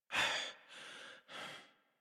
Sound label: Horse Calm; Warframe; Duviri